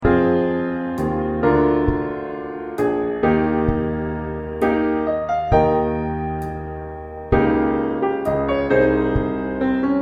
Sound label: Piano Drum